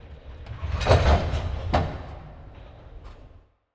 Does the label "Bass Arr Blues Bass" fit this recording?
No